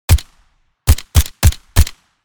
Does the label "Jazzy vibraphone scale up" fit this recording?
No